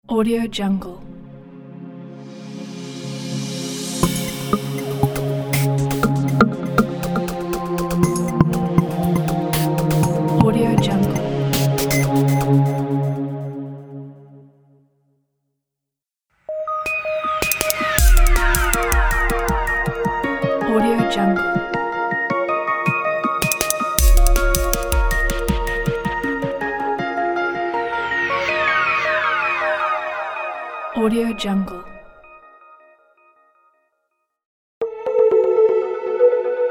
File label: corporate logo pack; purplevisions